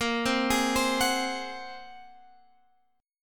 A sharp 7th Suspended 2nd Sharp 5th, Guitar, Standard; arpeggiated; JGuitar